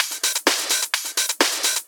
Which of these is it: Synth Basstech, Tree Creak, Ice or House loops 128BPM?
House loops 128BPM